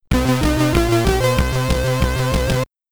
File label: Set, SID Stereo, Commodore 64; pro tennis simulator